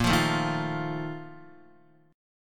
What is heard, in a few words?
Bb Major 7th Suspended 4th, Guitar; strummed; JGuitar